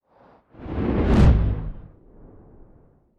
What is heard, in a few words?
Projectile; Afterbern Democralypse; Windows